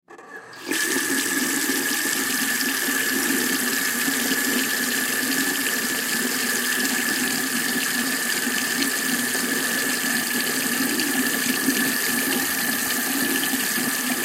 Sink Bathroom, Sink Turn On Run Off Drain Water Handle Squeak; Household Sink Bathroom Turn On Run Off Drain Water Handle Squeak; Blastwave